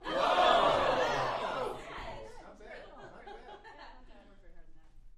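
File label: Crowd 15 Interior Rowdy; small int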